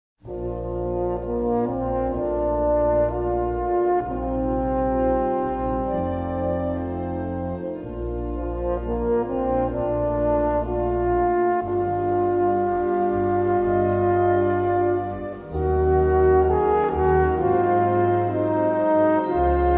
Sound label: Air Poétique for Horn and Band